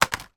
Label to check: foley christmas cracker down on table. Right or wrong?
Right